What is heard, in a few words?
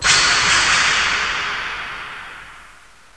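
METAL SCREECH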